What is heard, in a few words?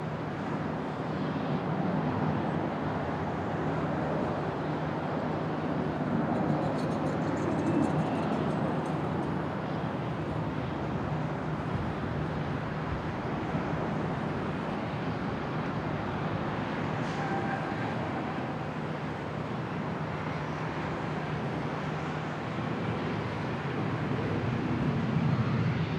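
exterior, ambience; ambient; Counter Strike Model Pack; CS:GO; nuke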